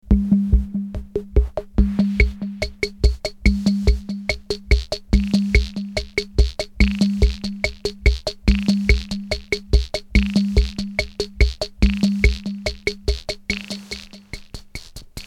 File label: MS20 delay